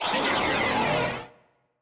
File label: Tail Jump Monster Cry